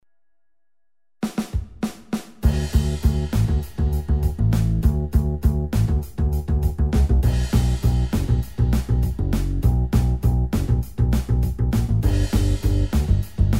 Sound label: Motown R B